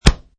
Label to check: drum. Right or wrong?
Wrong